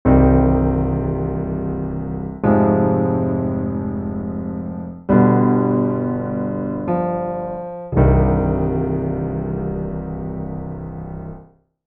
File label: comparison Ivory Bosendorfer